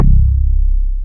Bass

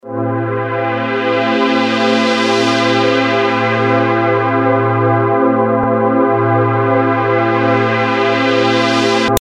Chord

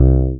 fretless, bass

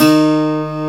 12 String B2, guitar

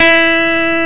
Rock Guitar Cleangtr E4

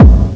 Bdrum

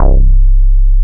Bass

